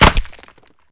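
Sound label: Bullet Light Ballistic Concrete impact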